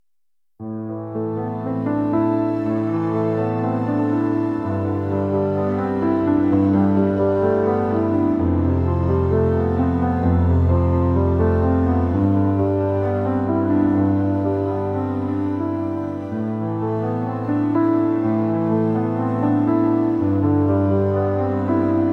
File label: calm